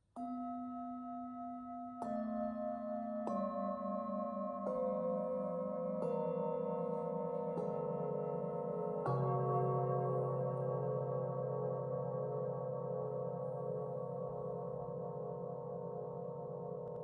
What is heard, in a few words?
Full moon set, set of seven singing bowls; 3rd octave